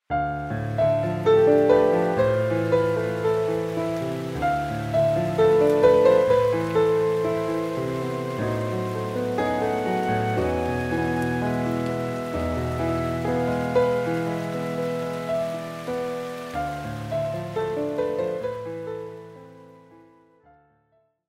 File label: rain